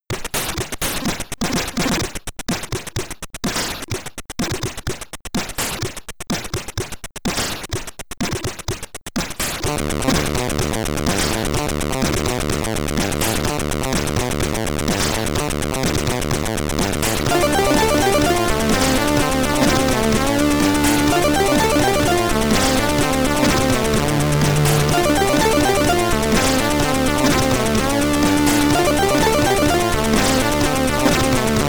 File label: Title Screen, SID Stereo; Thunder Blade